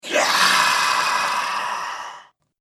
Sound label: Scream Vampire, Monster Sound Effects; horror sound effects